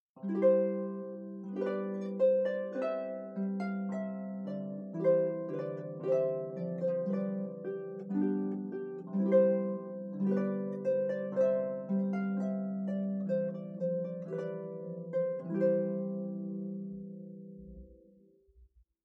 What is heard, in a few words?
Trumpet Voluntary, harp